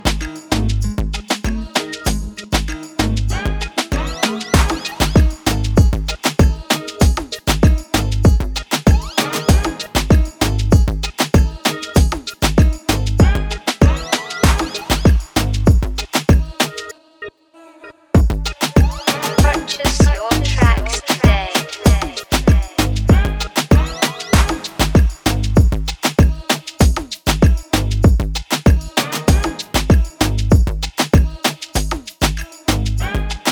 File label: Afrotrap instrumental